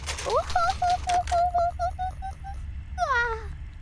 wo ho ho ho ho ho ho hoa

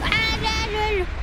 hope noises

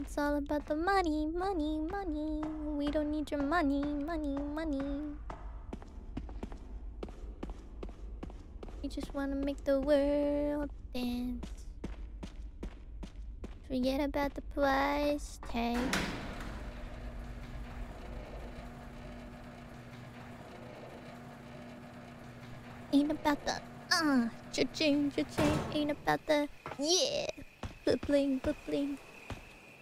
Singing; IRySinging

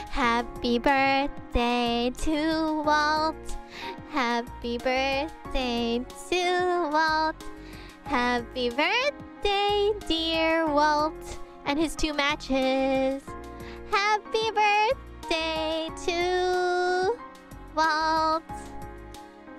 Singing Happy Birthday

